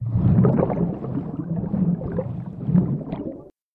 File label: jellyfish effect